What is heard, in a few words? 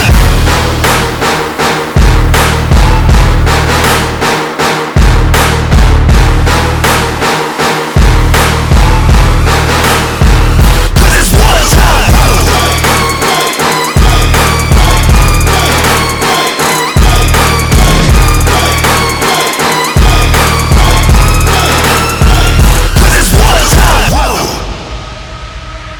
Ringtone